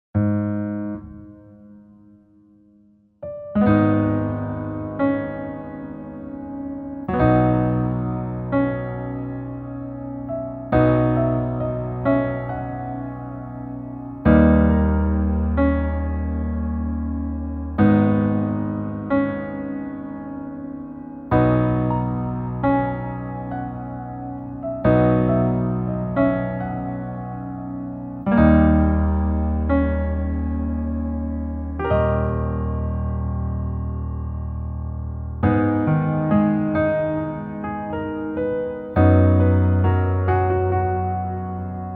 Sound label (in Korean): Piano ver, 멜로디MR, -1키